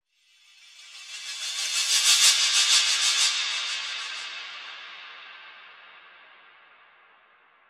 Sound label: Sweep Up 1 bar 124